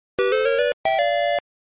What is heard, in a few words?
bonus game; Super Mario Bros. 2